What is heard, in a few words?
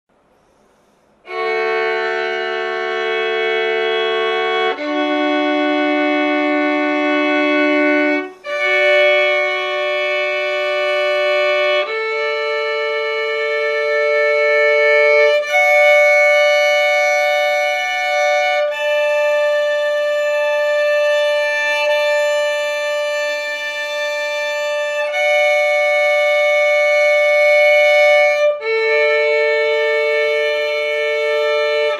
doublestop D dur